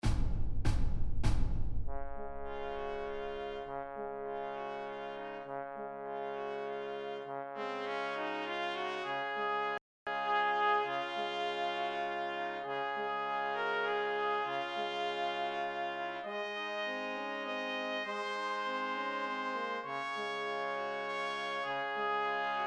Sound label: silent night brass 4tet